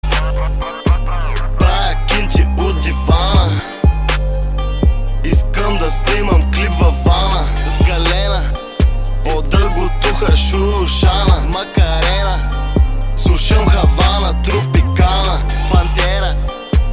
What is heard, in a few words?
Trap